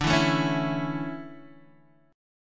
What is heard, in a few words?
D Major 7th Suspended 2nd, D, down, Guitar Open D